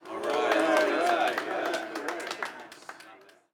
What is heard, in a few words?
street ball crowd cheer low; Crowds